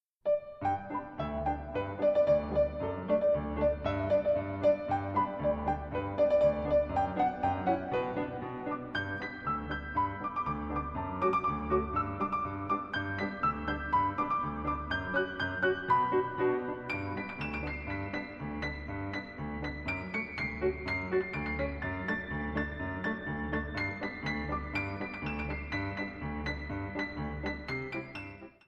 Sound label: Galop